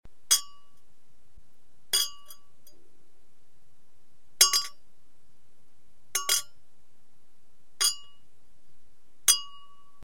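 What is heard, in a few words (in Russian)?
винные бокалы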